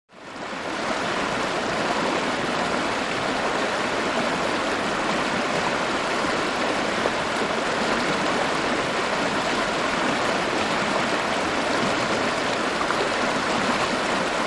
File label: River Medium Close